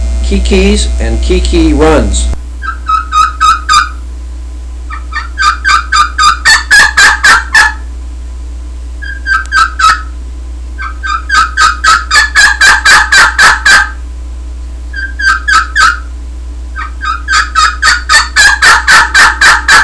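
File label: triple throat alum kee kee, turkey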